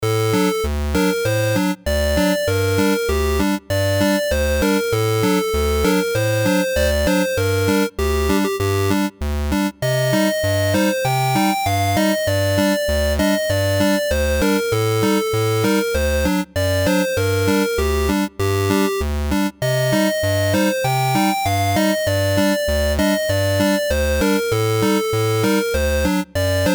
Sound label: 8bit; Korobeyniki